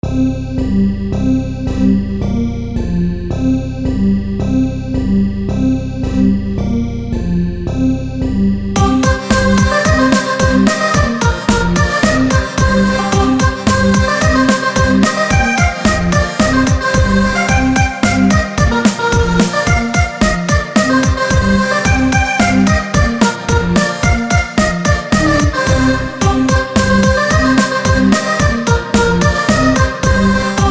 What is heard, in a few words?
polka